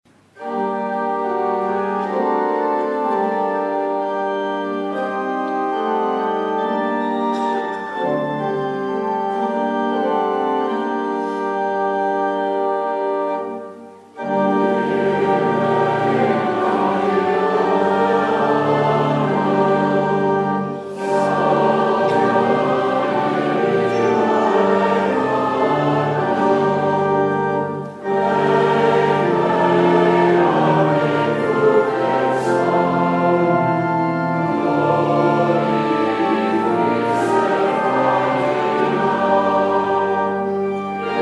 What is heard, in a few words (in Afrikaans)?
Gemeentesang